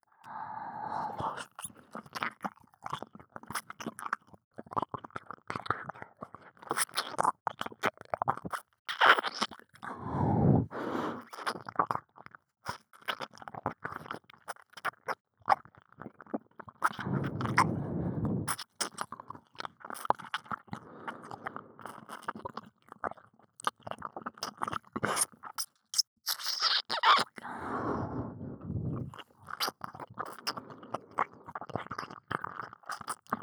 右耳舐め, ASMR

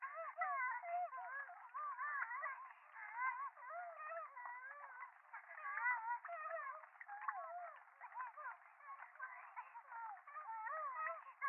電話 可愛い喘ぎ 雨上がり, ASMR

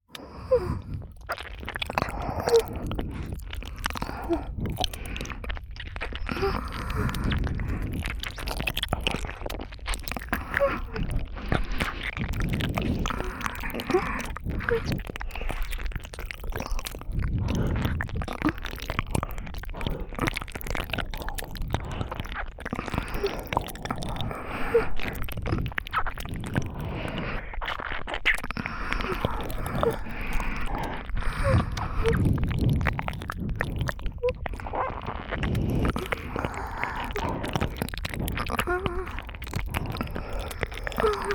(両耳)耳奥舐めねっとりエッチ, 黒耳 パンパン系効果音版

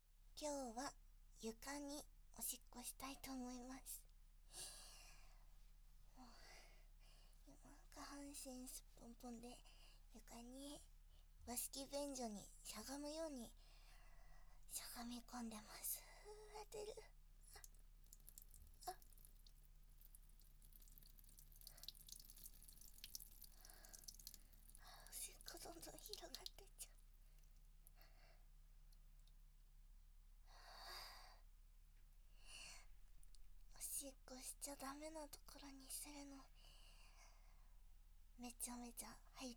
お部屋の床に！おもらし、しちゃったッ！(バイノーラル)

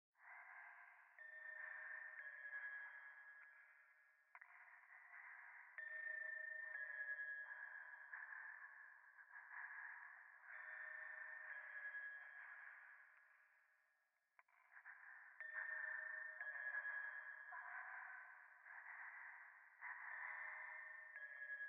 ゴースト 右耳快感吐息 神社

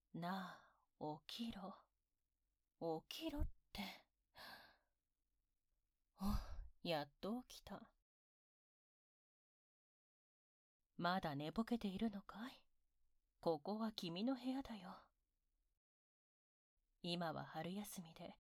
耳舐め, ASMR; 勉強のご褒美に耳舐め騎乗位してやろう; 効果音なし

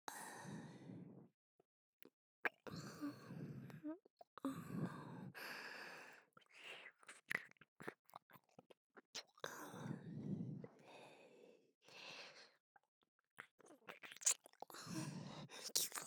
右耳舐め

